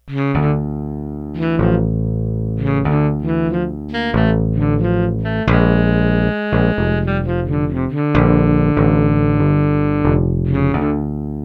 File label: electric bass breathy alto sax